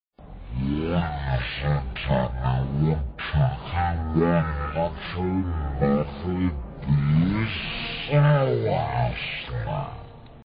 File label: metal balls; vapesquared